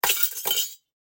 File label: Glass Break; SoundsCrate